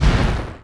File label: thunderball collide